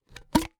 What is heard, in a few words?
Foley Can Open; Pringles